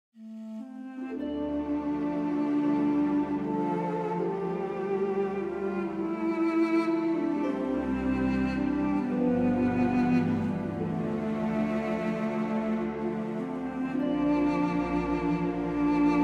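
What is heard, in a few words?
Music Cue Birth Solo Duduk; Crusader Kings III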